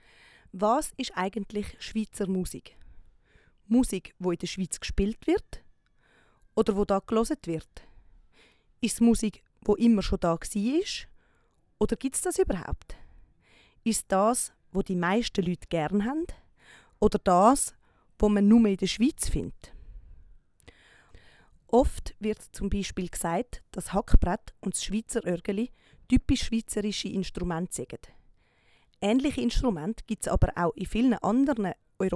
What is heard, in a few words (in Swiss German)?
Schwiizer Musig